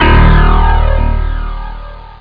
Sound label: push up; tritus